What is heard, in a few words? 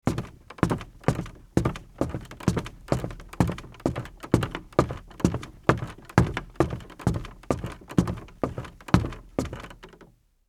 Footsteps Sneaker Wood Down